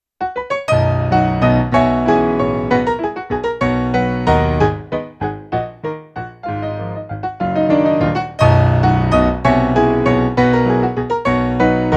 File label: waltz; brahms; op 39 no 1